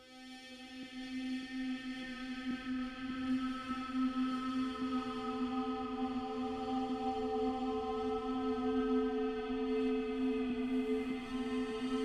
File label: harmonica